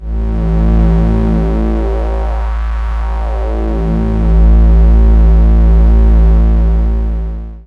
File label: Yamaha CS80 Filter HP LP